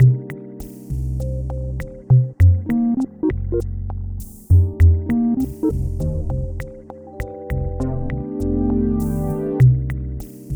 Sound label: loop; pseudOs